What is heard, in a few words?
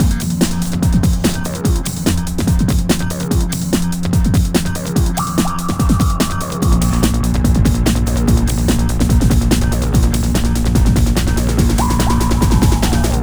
Decimater, Hardcore Breakbeat 145 Cmin; Big Fish Audio; Hardcore Breakbeat Action